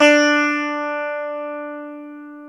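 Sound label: guitar electric 12 string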